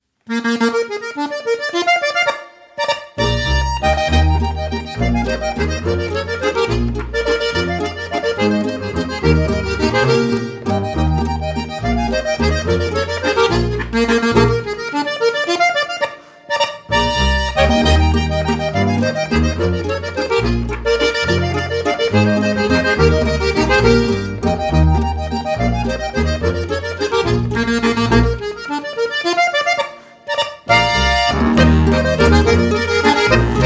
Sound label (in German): Landler